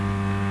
Electric Field; Working Model Program

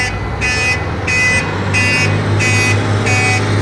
Car Going In Reverse; Working Model Program

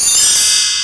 Bell; Working Model Program

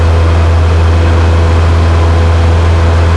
Propeller; Working Model Program

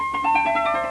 Harp; Working Model Program

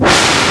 Rocket Takeoff; Working Model Program

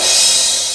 Cymbal; Working Model Program

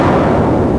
Explosion; Working Model Program

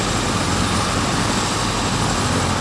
Motor Helicopter; Working Model Program

